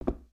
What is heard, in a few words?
Foot Wood Boot Walk, footsteps